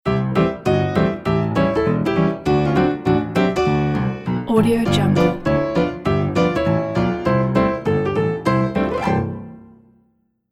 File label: retro piano ending logo